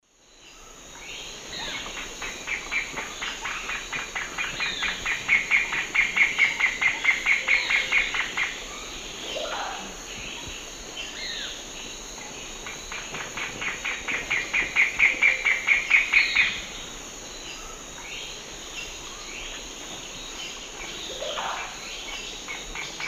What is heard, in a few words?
Furnarius torridus, song; S. America; Eastern Lowlands